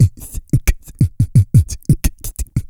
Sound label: SWING YO HIP, Vocal Blues Soul; Spectrasonics; Planet